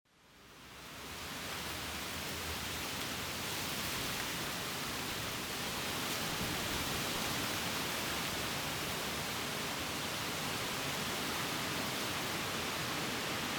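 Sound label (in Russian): tree leaves rustle, gusts of wind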